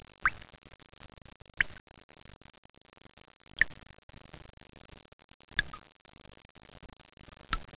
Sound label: Water, 自然, 水声; 特酷声音素材库